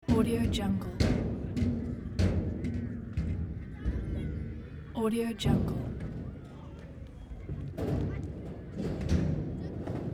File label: playground skate ramp children; cinematic-sound-design